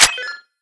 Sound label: sb mine trigger shoot, weapons